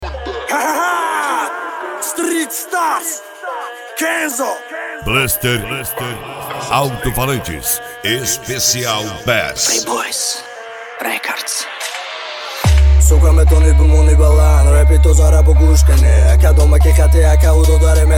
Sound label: bluster alto falantes especial bass